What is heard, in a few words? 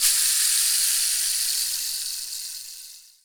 RAINSTICK; WorldSounds